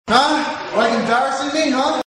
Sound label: huh